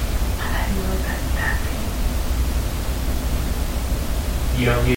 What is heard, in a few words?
evp